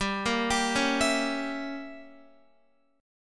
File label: G Diminished 7th, Guitar; arpeggiated; 4F,4A,5C,5G,5C,6E; x,10,10,12,13,12; JGuitar